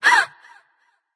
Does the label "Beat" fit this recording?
No